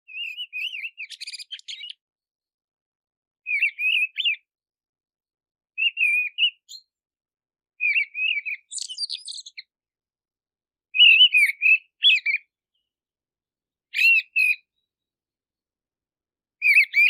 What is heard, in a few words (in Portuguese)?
Canto do tordoveia